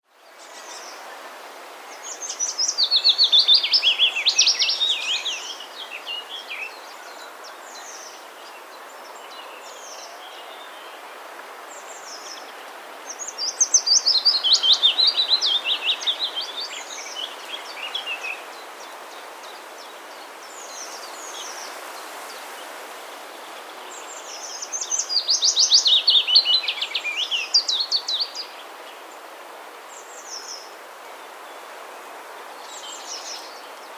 willow warbler; fynn valley suffolk

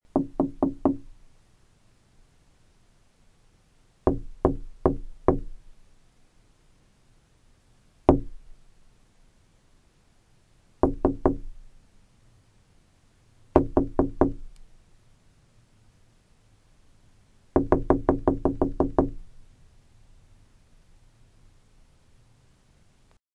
knocking on glass

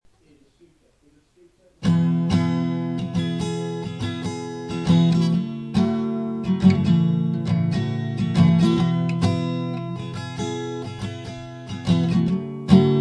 acoustic guitar nonsense

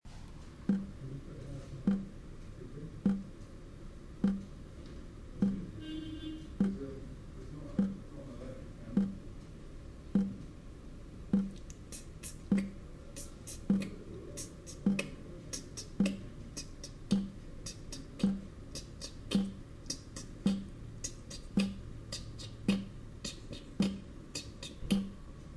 rythmic tap

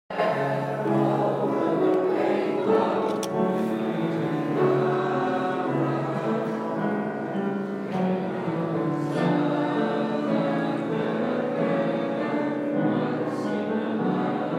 school choir; lansdowne

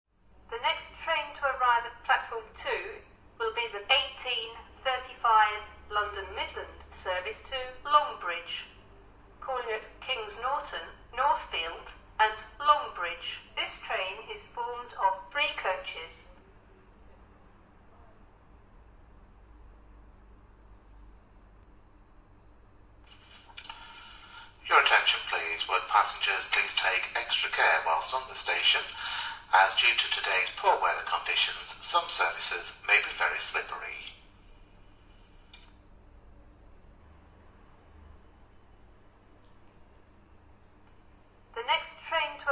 passing trains

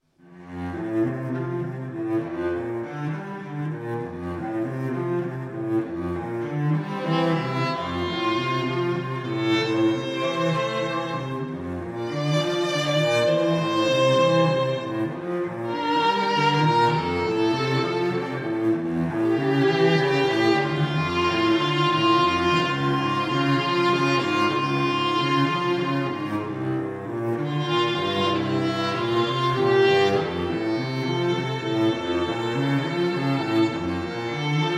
string duo; toast

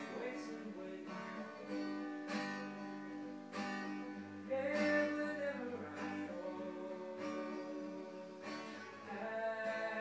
singing

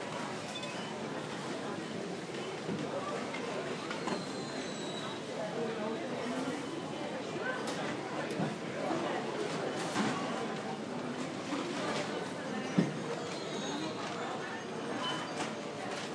ambience; morrisons byker

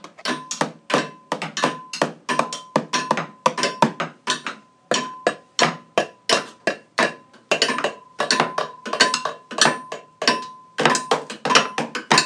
timbre chair sounds

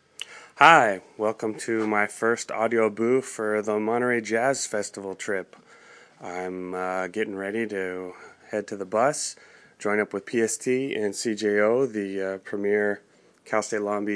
jazz fest; monterey

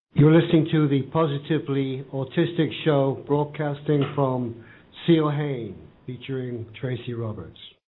jingle; stevens pozaut

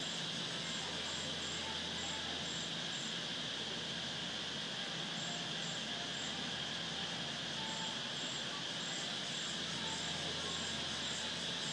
thai hotel atmo

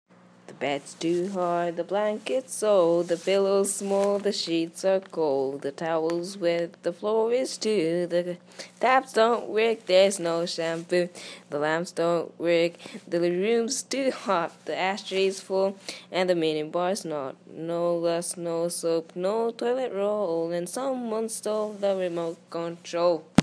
reluctant singer

